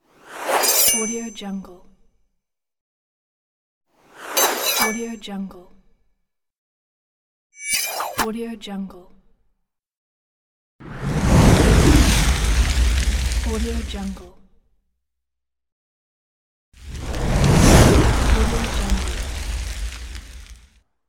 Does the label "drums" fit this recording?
No